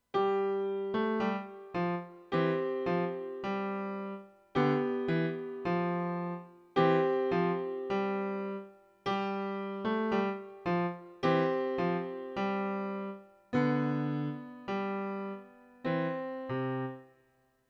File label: London Bridge